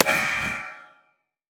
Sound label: item pirate cutlass; LoL; items